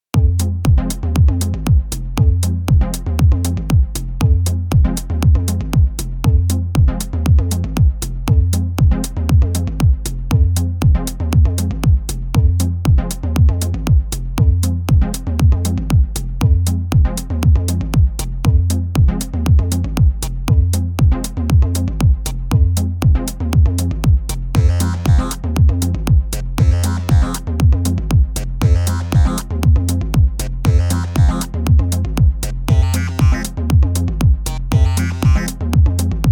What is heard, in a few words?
background music